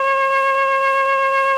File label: flute F, FLUTES; Best Service ProSamples vol.55 - Retro Sampler; AKAI